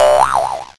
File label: hit, player